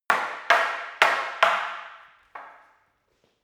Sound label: staff wood fighting sequence short